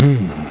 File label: HUMM; BattleSNDS